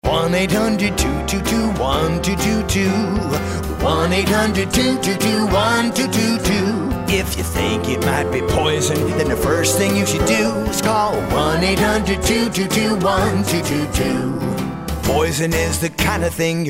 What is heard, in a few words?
Jingle English